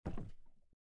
step wood

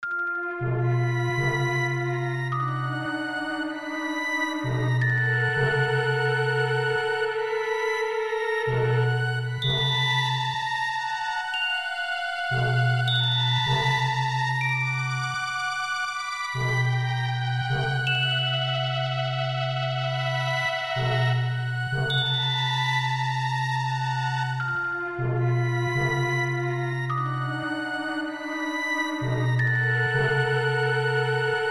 Tension

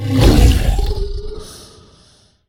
Roar

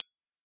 Fld Emo Surprise; The Denpa Men; Android

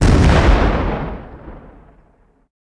Weapon Tank Gun Heavy

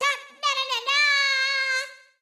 Fanfare (English); Hyrule Warriors Age of Calamity

